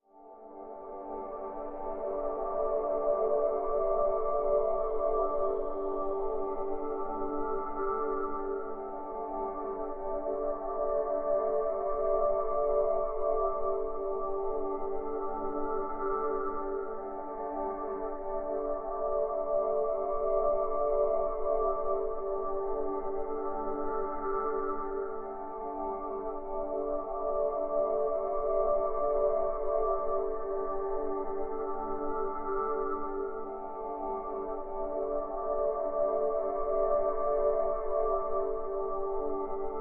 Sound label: Pads Bliss (G)